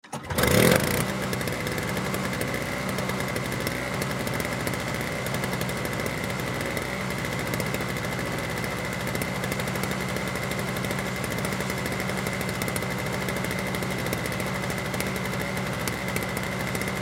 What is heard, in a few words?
Snow Blower Run, Snow Blower Pull Start Idle Turn Off Exhaust; Snowblowers; Household; Blastwave